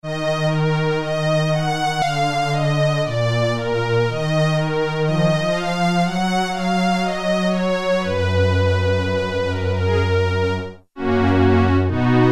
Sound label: Bowed Strings 8', Korg Poly-61M